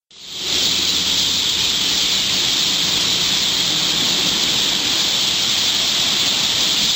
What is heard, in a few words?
Starling